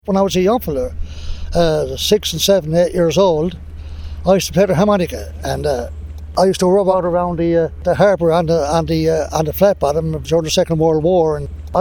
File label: harmonica